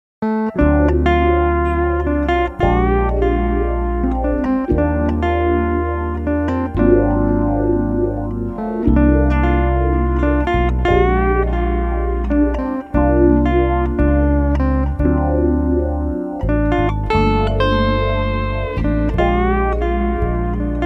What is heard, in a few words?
Steel